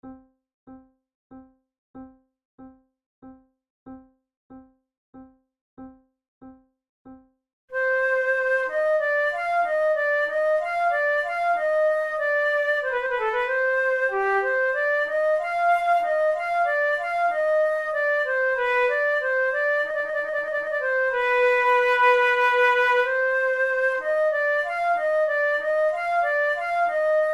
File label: sarabande; naudot; 6 babioles op10 no4